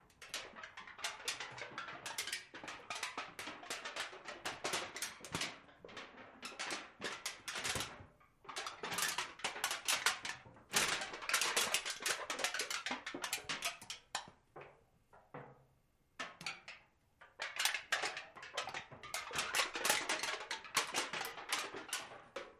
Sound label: Metal, Foil Crinkle; Foil Lightly Crinkles And Tinkles ( Aluminum Foil )